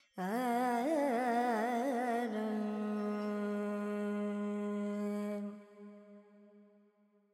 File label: FX Dorian, Vocals, Carnatic Female, Alaps, 70 G; Sample Banks